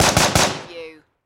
Machine Gun M4A2

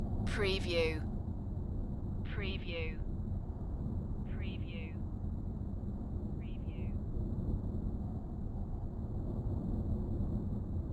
Strong Coastal Wind; beanstalk audio

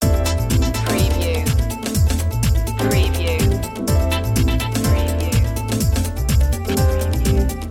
dance loop d

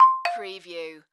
Multimedia Chime; sound-effects-bible

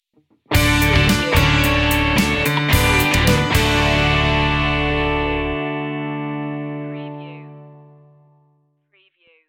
sting

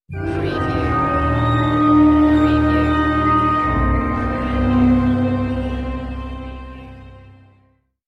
Dark Production Stinger

